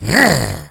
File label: zombie attack; svencoop; justzombies